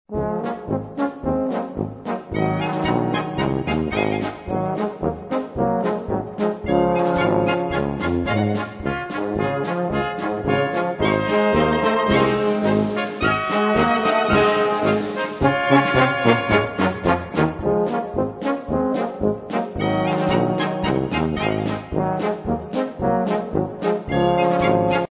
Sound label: POLKA